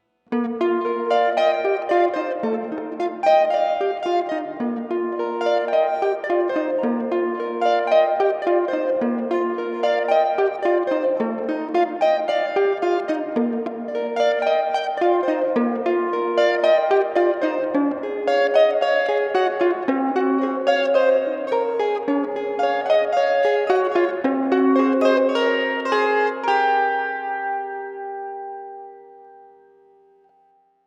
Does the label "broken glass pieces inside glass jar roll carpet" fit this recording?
No